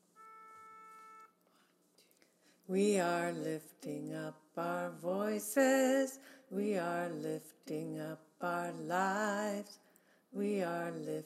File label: tenor; choir; The Freedom Choir